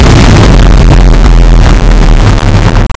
sharp metallic shield imp; battlefield

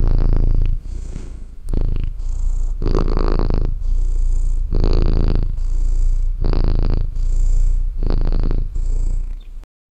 cats steady purring; domestic animals

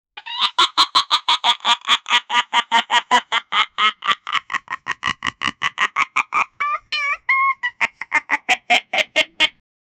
evil cat laugh

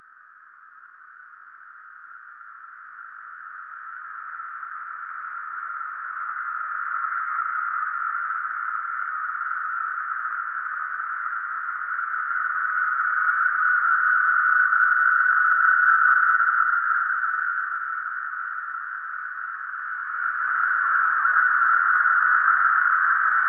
sound of a crow walking; birds

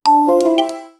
a success sound effect; history

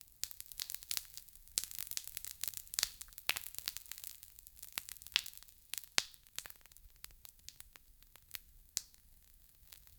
cracking lava bubbling

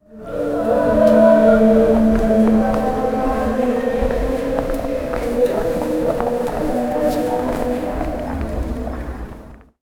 haunting medieval street

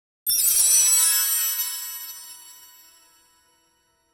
bright ascending chime; musical

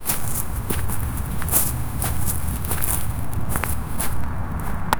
happily walking in the gr, walking

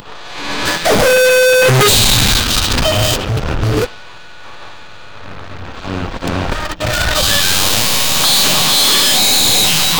pooping

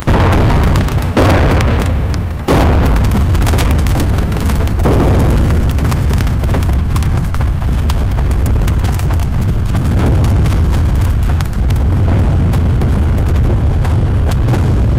two massive armies clash, battle cries

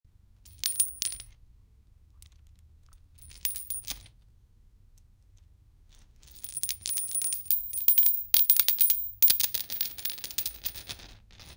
the sound of money, currency

